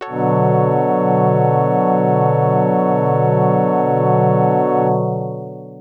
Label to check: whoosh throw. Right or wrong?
Wrong